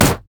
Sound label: mech melee basic attack; minions; LoL